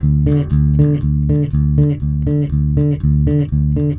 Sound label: 110 Pop Basic Bass D, E-Bass; Pop Rock